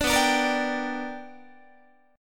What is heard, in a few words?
B-Minor Major 7th Sharp 5th B down Guitar; BmM7#5 strummed; 17,19,17,20,18,18; 4A,4E,5A,5D,5G#,6C#; JGuitar